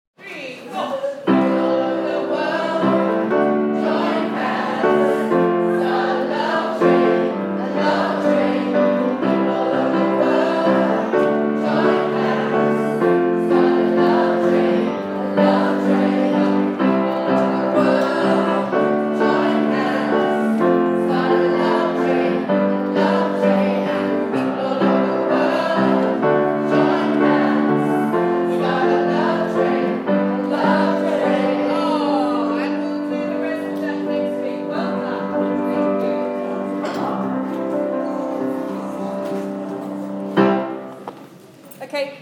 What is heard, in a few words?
school choir; lansdowne